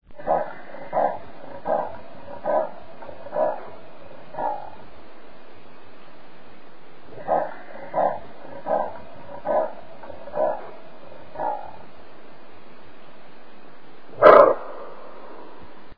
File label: leopard panthera pardus